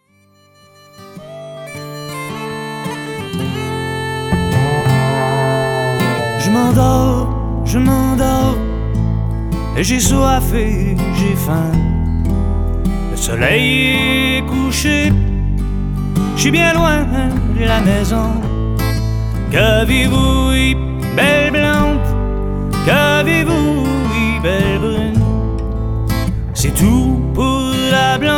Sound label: Waltz; Je Mendors; Donnas